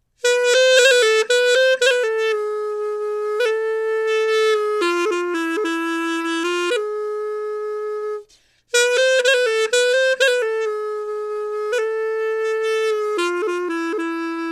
chalumeau melodie

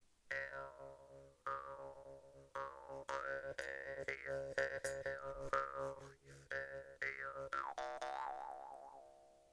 maultrommel gross

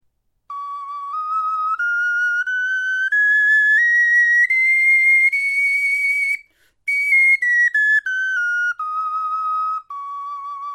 tonfloete tonleiter

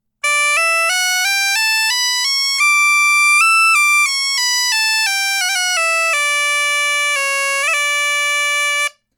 punteiro d tonleiter

